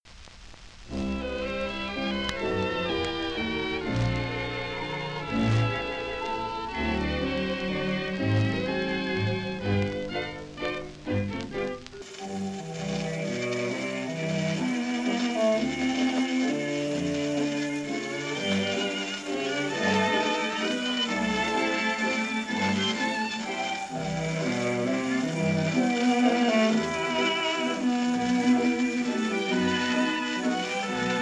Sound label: Orchestra instrumental Version